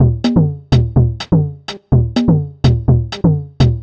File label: perc lowered 125, Percussion Loops, Classic Chicago House